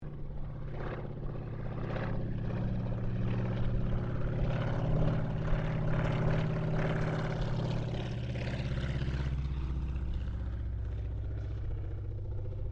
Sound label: AIRCRAFT T-28D TROJAN PASS DISTANT; Airplane Sound Effects; Airplanes (Sound Effects:Vehicles)